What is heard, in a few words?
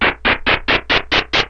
dungeon staircase up; zelda